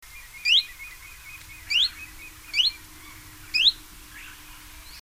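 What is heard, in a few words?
Phylloscopus collybita, Chiffchaff call; Ridala, Läänemaa, Estonia 30 April 2005; During spring, the call is the same; Linnut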